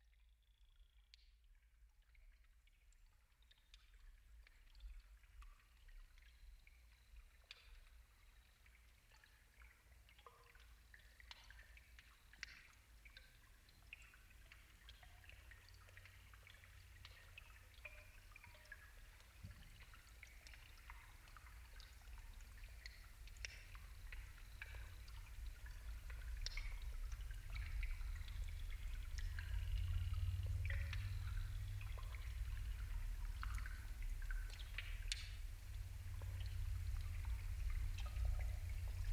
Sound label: artspace soundscape